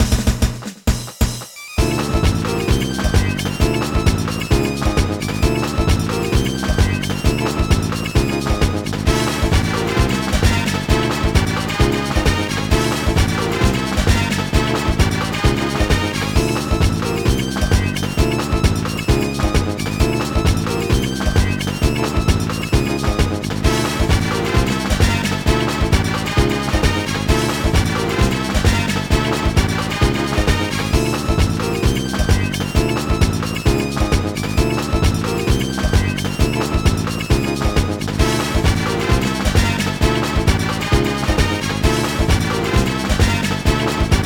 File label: Bonus Stage; Street Fighter III 2nd Impact